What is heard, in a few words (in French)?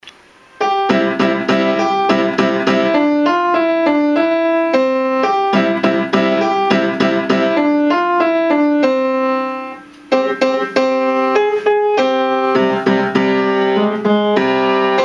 pianiste; Roussel, Fabienne